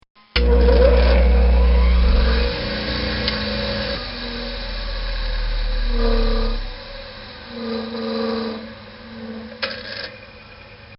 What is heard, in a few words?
Grinder Start And Stop, machine; Machines; Bobby Cole